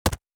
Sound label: UI Simple, Interface